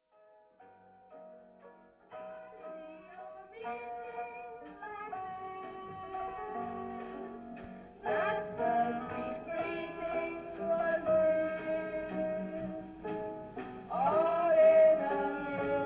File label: waltz